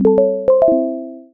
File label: Calendar Reminder; Emberfall